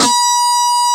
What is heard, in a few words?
D5 GTR FB, Bass Guitar; AKAI S6000 CD-ROM - Volume 1; JOHNS GT